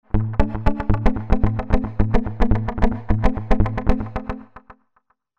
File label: Tubesynth Scattered